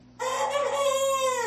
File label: weak chicken screaming